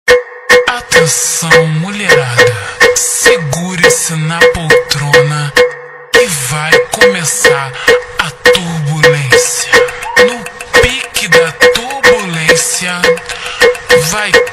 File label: automotivo slowed reverb